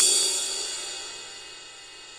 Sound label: CYM RIDE, DRUMS SINGLE HITS